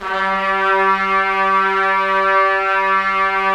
Trumpets; Roland - LA Composer vol. 4

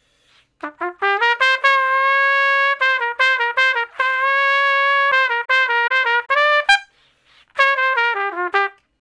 Trumpet Shuffle (G); Crimson Blue and Fabulous Horncraft 4 RnB; Horncraft for R&B; Solos

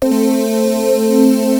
100 BEES; Best Service Dream Experience

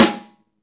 snare, Roland

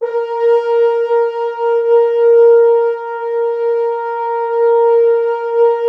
Orchestral Brass

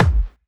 Kick, Drum Hits

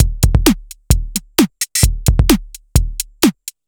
Synth Loop, BPM130

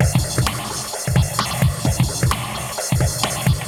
Moog Phaser HR-16B (130BPM), cyborg beats

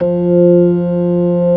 ORG. F3; B3 FULL

